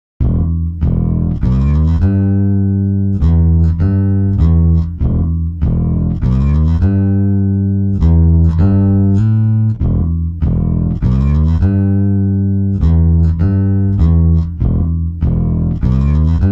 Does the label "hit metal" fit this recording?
No